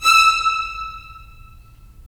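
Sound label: strings violins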